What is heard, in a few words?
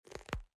bite